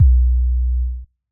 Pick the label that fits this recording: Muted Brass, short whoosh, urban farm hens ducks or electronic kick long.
electronic kick long